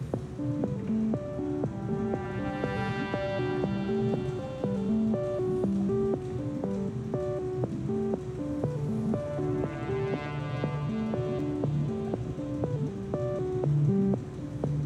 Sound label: fade to drums; CommandBlockBuild A; mcsm music; Netflix